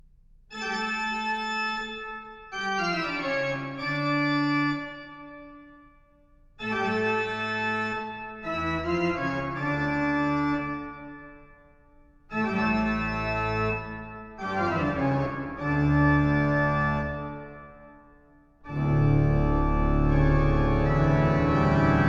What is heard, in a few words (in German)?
Großes Pleno, organ